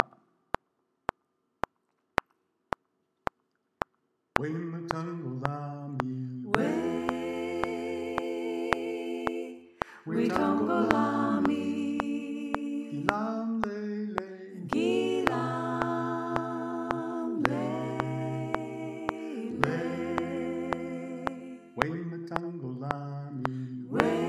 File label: alto descant; choir; The Freedom Choir